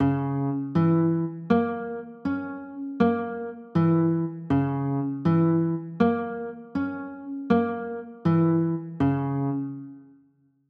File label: d flat m6, chords